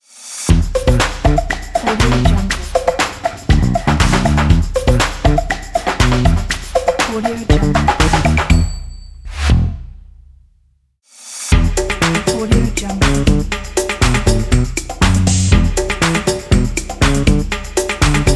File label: bass guitar logo; wolf-music